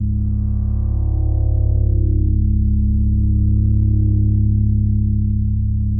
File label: ambient sleep, Drum N Bass; Sonic Foundry (Sony Creative Software); Knutrix Drum & Bass